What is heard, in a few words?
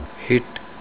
hit